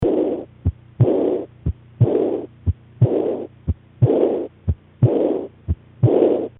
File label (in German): Aorteninsuffizienz